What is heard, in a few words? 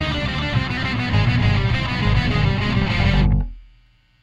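Riff, guitars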